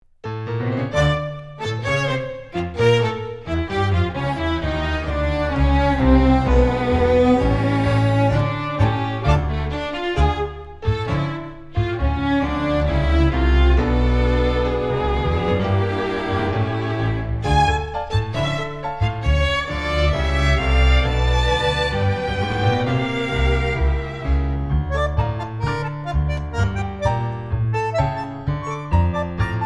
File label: Tango